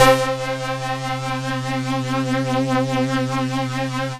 Synths Note C

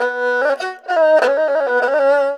ERHU LICKS

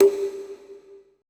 CONGA R; BPC DRUMS

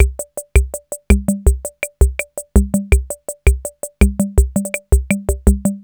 TR-66 RHUMBA; Crypton - DrumBase5000

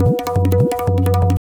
tabla, 175 bpm; Zero-G; Pure Tabla

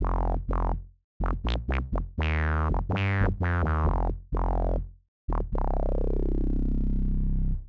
Bass synth squelch C, 125; PowerFX - Nu Jazz House

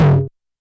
Tom Ana Dist, YAMAHA RM1X

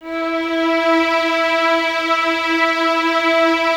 E 4, String Ensembles Violins; Miroslav Vitous; 11 Violins

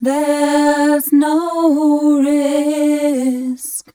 f mel d, fem Vocals

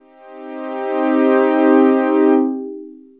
Strings, dx7

